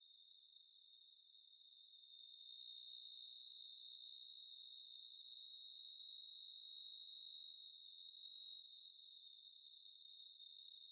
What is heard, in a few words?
Neotibicen auriferus, cicadas songs